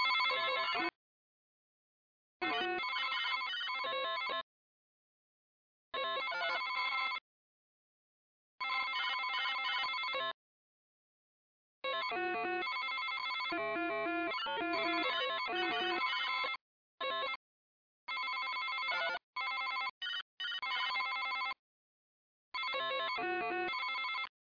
Ambient Comp Beeps; Let's Discover Michigan!

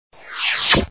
Over Sound; crazy fishing

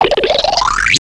Zip; Princess and the Pea; SoftKey

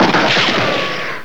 gun shot; Software Explosion 2 - Virtual Computer Pets; yamaha xg

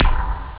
drums bass; da capo

